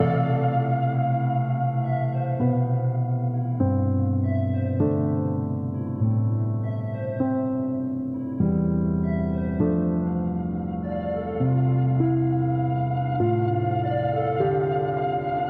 Prismarine Colossus A Piano; Hero in Residence

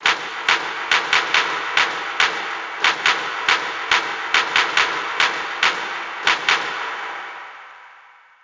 Clap; MLB Power Pros 2008

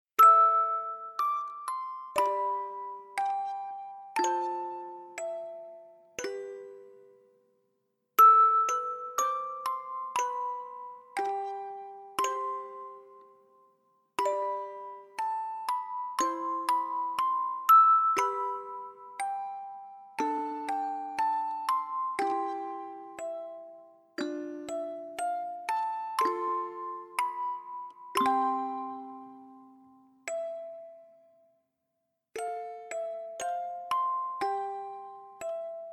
Musique Box Melody; Layers of Fear Inheritance

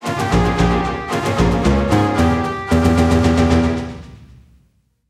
Crocodile Cacophany Death; donkey kong country 2 fan remastered

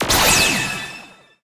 Great

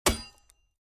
sword Wood

